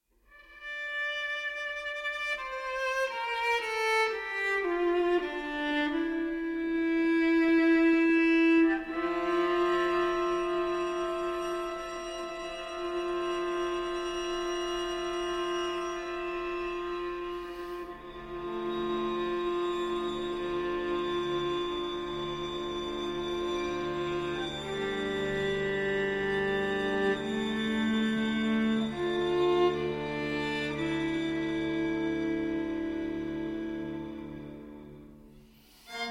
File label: String Quartet; No. 2, Images II. Vetrarmorgun